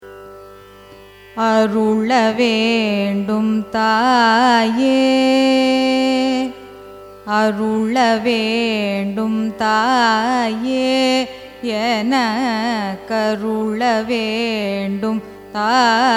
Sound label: raga saramati carnatic; carnatic music